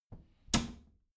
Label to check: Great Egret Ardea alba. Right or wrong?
Wrong